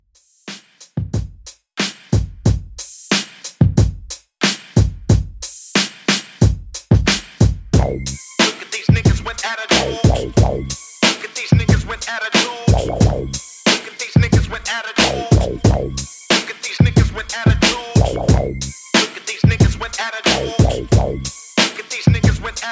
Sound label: Old School Redrum 91Bpm Dirty